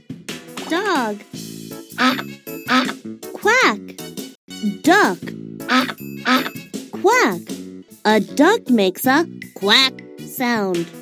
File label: duck